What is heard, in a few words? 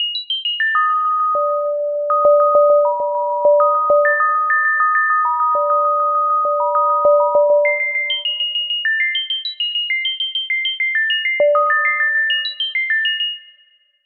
bell synth; Max